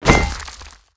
Mining; Foras; Gamigo

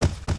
Tiger Run; Seer; GamigoNA

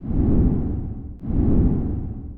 Dragon Horse Walk

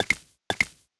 Alpaca Walk

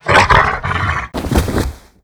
Snowy Wolf Die

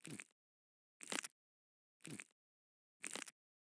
Snail Idle

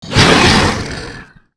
chimera attack

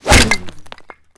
Skill Atk; RouSkillRubi; Seer; GamigoNA

